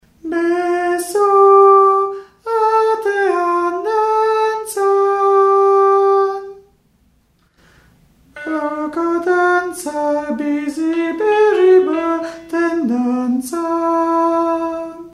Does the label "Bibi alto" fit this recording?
Yes